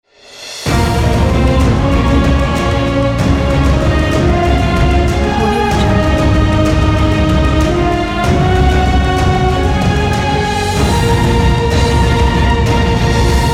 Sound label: epic emotional logo; logoprod